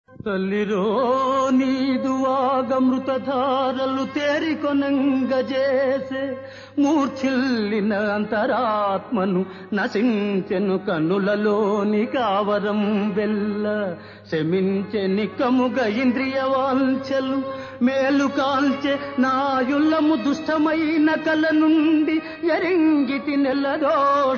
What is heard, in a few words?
Padyam; Chintamani